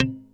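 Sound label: C2 dead, stick bass; D-STIK BASS1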